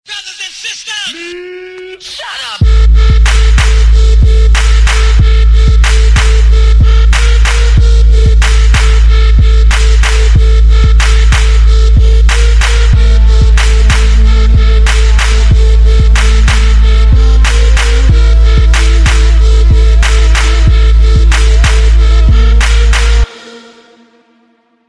Trap

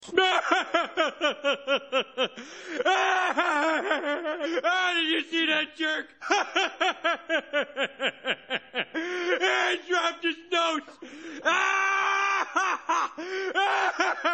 laugh, funny; homer

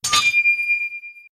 Crystal Switch; Zelda

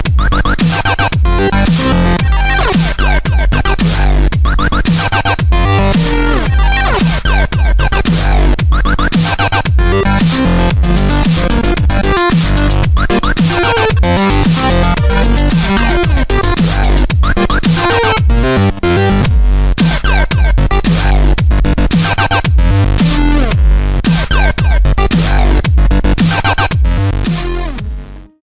Hard Electro DubStep

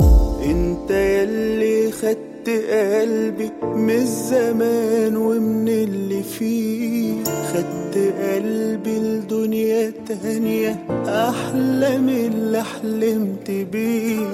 piano; holiday

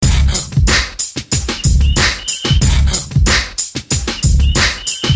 Hip Hop Loop

